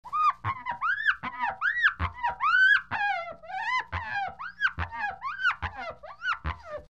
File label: Glass friction creak